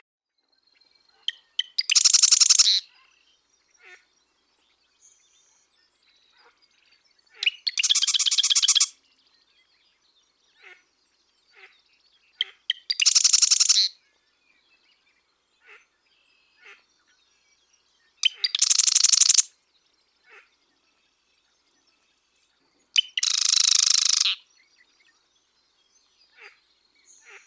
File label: West genes West songs, Marsh Wrens, Normal songs; Donald Kroodsma; western singer